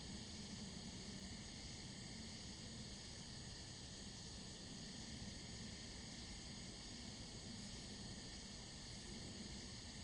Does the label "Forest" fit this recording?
Yes